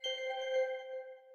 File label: blind unlock; Samsung Galaxy Tab 4 SM-T337V; ui